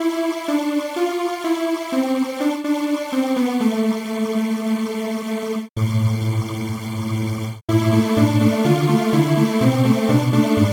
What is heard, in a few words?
polysynth